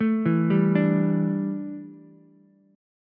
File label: A 7th Suspended 4th, A, down, Ukulele; A7sus4; arpeggiated; JGuitar